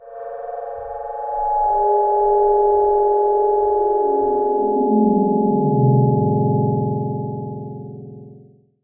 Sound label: cave, ambient; Minecraft